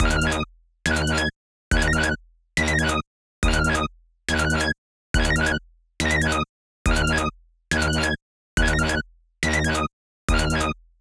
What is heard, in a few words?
8 bars